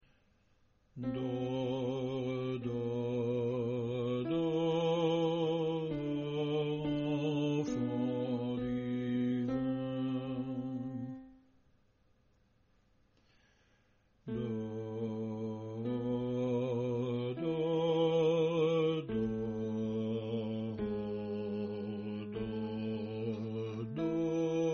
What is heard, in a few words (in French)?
basse; entre boeuf et ane gris